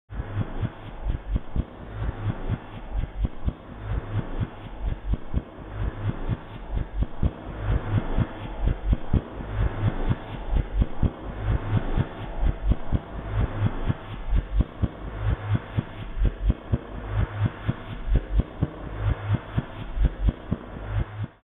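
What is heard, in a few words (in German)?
Strymon Magneto Drums Fahrt rückwärts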